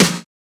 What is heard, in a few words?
Snare; FLPRO